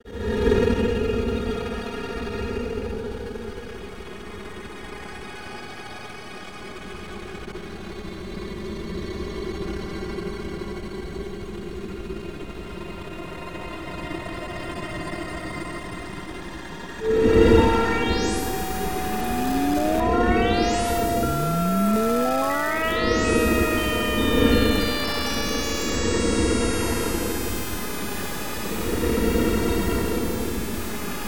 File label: digital cat